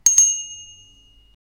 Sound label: starting mining